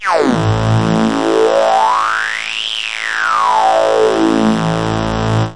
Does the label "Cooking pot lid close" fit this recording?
No